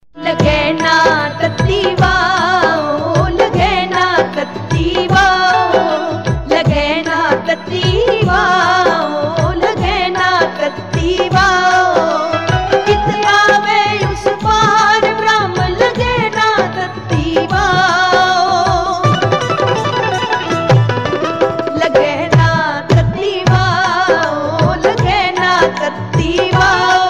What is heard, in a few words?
Punjabi Gurubani